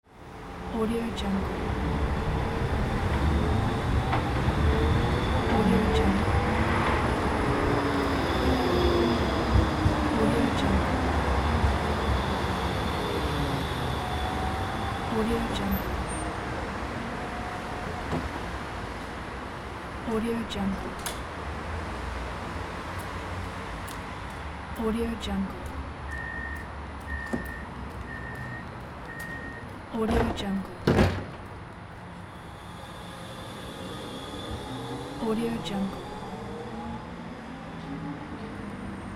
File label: tram arrival